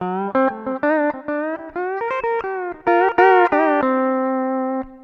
RHYTHM TWANG; Bass; Sonic Foundry (Sony Creative Software); Not Just Another Pretty Bass

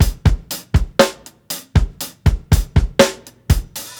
120 16TH HALF TIME, DRUMS LOOPS; BASEMENT DRUMS VOL 2

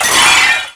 Fairlight CMI III Glass Break, Drum Machines; 300 Drum Machines

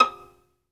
SOLO VI, Synclavier Strings; ILIO

